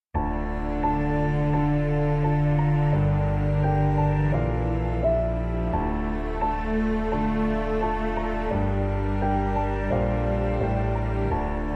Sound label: Emotional Piano